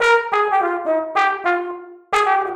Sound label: Trombone Effect, effected trombone